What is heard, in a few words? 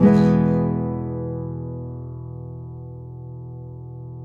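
nylon guitar down major E, flam chords; Spectrasonics; Hans Zimmer Guitars VOL-2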